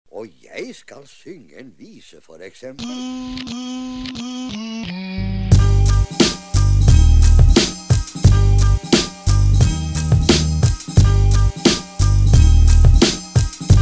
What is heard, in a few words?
Beats